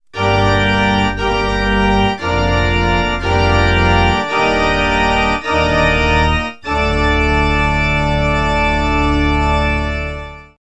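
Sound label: organ bridge, music, pianos; KABOOM! - Wild and Wacky Sound Software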